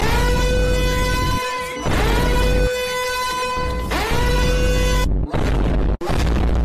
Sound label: loud alarm